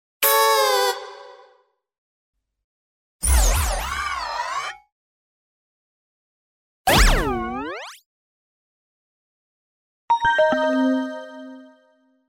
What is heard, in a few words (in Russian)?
korotkie zvukovyie effektyi chetyireh grupp jivoy video animatsii